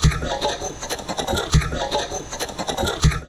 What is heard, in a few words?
VOCOD, Drum Loops Crazy Processed